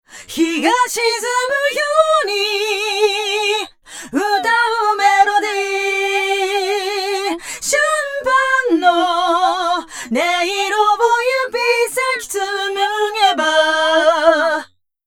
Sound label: Chorus Flanger FB High